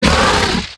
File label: tank hit; Star Wars Knights Of The Old Republic I; XBOX; teran